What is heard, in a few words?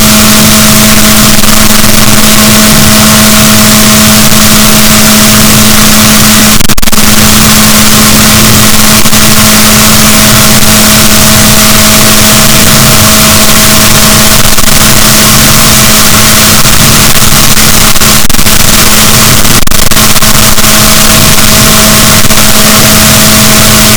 rorquals, Balaenoptera Physalus, boat